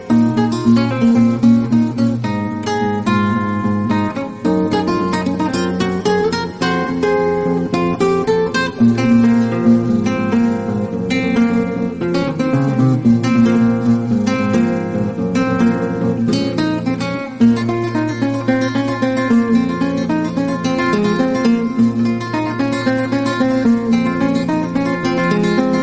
acoustic guitar

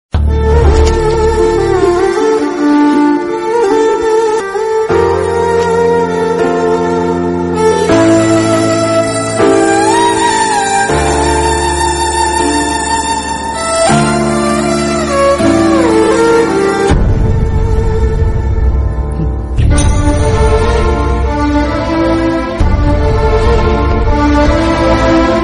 bgm

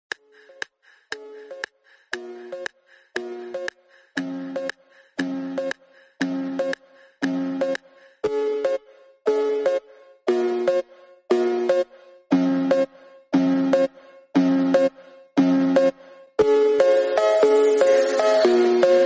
theme instrumental bgm

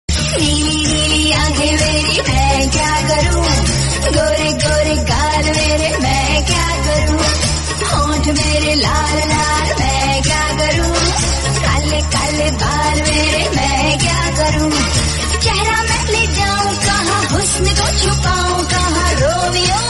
slowed reverb hindi song

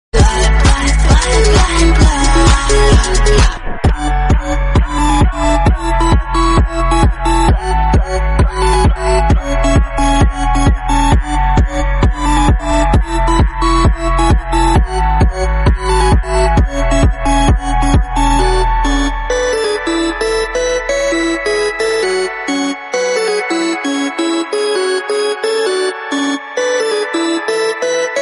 pop muffled instrumental

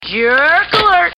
alert

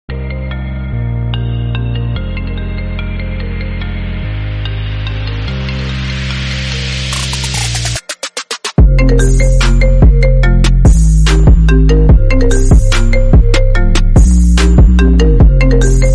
iphone trap remix

